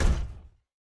Footstep Robot Large

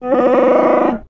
Notification

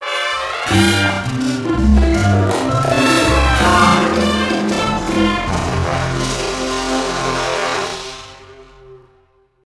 Echo

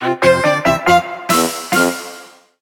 Reward Claimed

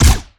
Lasrgun Guardian Pistol Fire

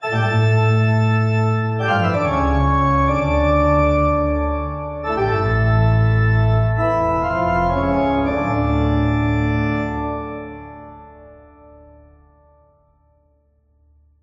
Bach Toccata and Fugue in D Minor

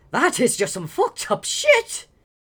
Male Reactions

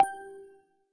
Shrine Select

